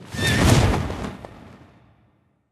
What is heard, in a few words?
Teleport; Jacko